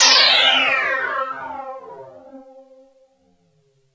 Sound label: SPLASH, SF EFFECTS; Q Up Arts; Dream Experience